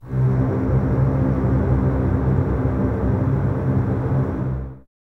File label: C 2, Basses Tremolo, String Ensembles; Miroslav Vitous; 90 s Sample CDs